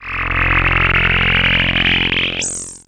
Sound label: SH101 Tone